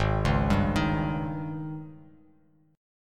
Ab Major 7th Suspended 4th, Bass Standard; arpeggiated; JGuitar

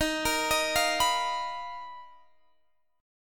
B Major 7th Flat 5th Eb

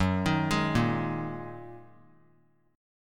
Gb 6th Add 9th Gb down; arpeggiated; Gb6add9; JGuitar

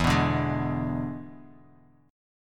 E 7th E down; E7 strummed; JGuitar

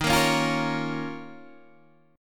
D sharp 7th Suspended 2nd, Guitar, Open D

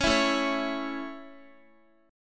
A Major A, Guitar; strummed; x,x,11,9,9,8

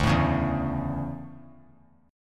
D Major 7th Suspended 2nd; strummed; JGuitar

